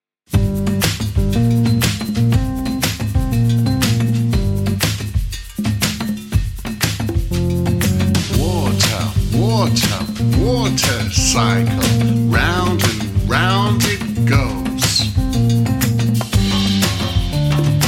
Water Cycle Song w vocal; Goldilocks

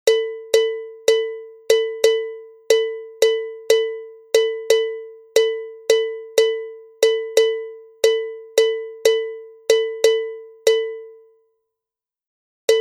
LLAUNA SAMBA REGGAE

